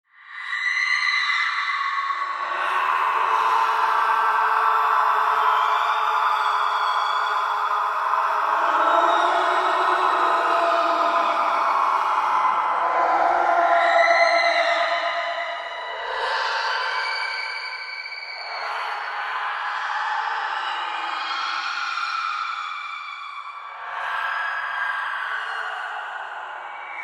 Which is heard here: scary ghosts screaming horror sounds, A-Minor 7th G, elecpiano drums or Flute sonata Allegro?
scary ghosts screaming horror sounds